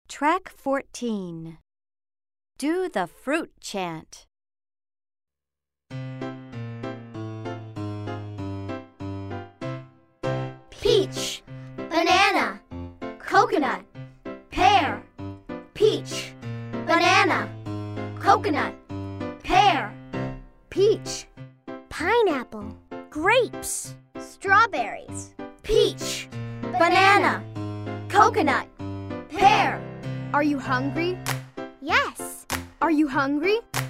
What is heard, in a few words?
Do the Fruit Chant